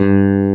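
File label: Clavinet soft G1; USB Soundscan vol.09; AKAI; Keyboards Old School; CLAVINET1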